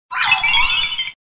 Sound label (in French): Cri